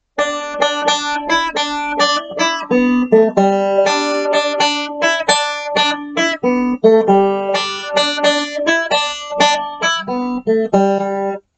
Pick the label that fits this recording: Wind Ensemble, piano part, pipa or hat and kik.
piano part